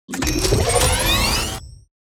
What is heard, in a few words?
Proton Pack Power Up; Ghostbusters 2016; Quick Version